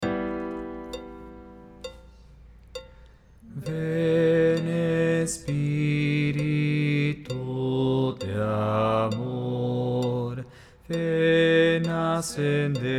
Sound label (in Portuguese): Tui amoris ignem baixo